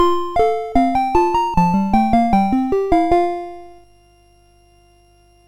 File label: sid MOS6581R4; Security Alert